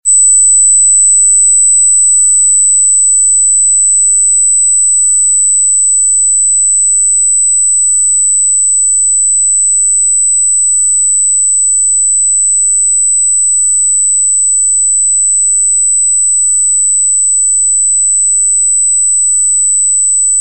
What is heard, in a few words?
High 8000 mhz